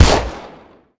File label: fire, uzi; Urban-Coalition; Decay; arccw ud